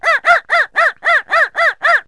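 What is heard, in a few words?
small bark; animals; Wired for Sound Pro CD; Aristosoft, Inc.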